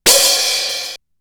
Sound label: CYBER KIT, DRUMS